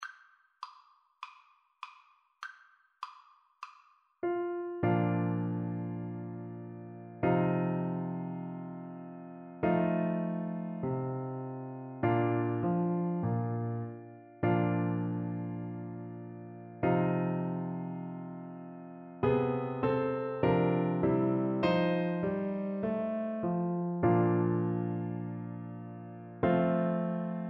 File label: america the beautiful, Tuba